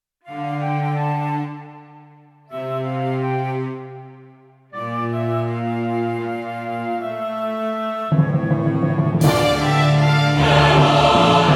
prelude

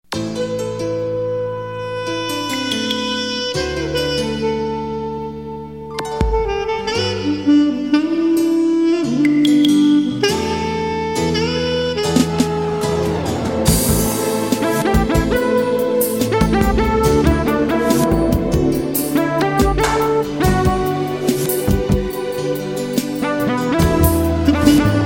ufo instrumental

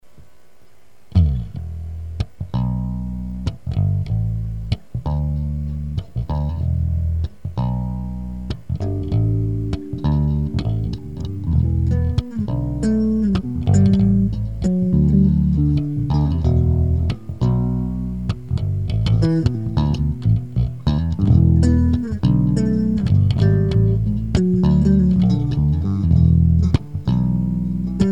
bass groove jam

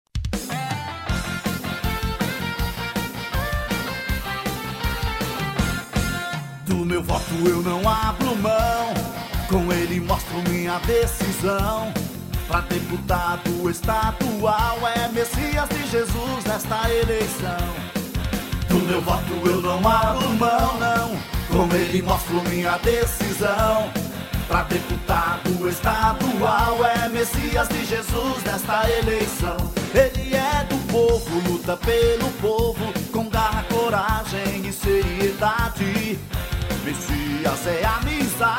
pop rock

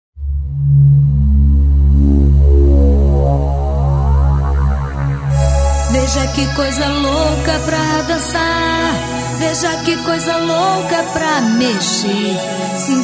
dance music

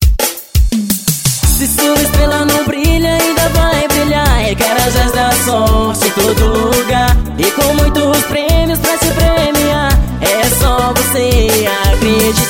jingle; carajas da sort

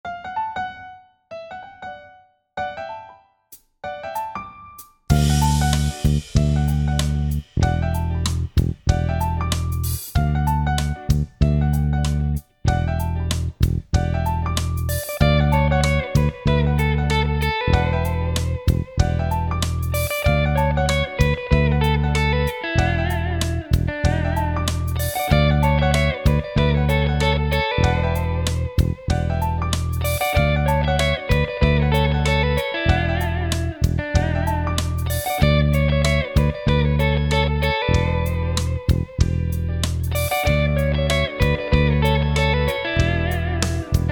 instrumental; brincadeiras

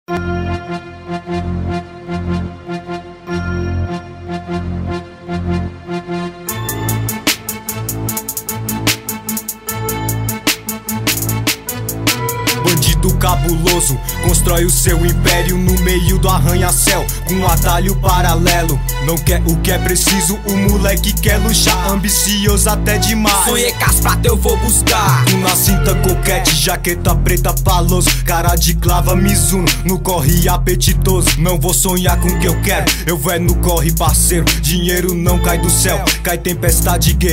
rap

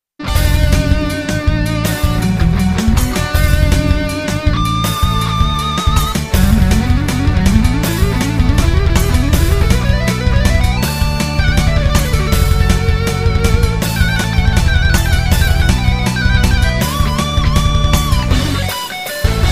instrumental

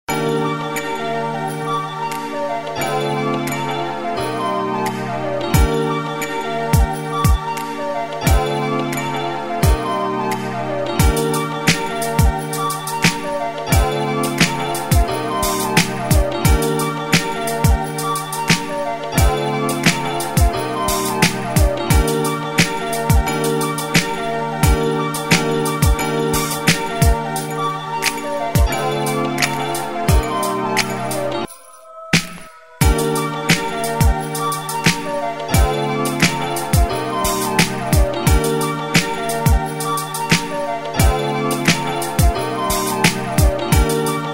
beat

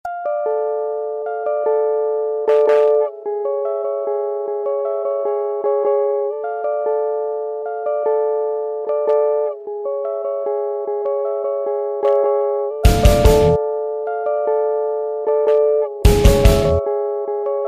touching the stars instrumental

